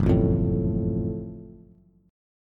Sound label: F sharp Minor Major 7th F sharp Bass